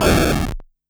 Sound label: Game Sound